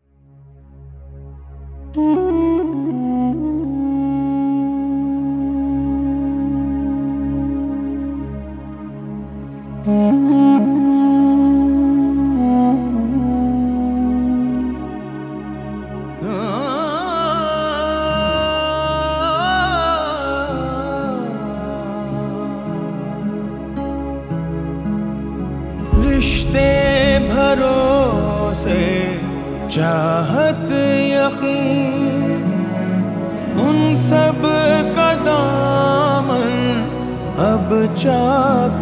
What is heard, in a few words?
Sad Version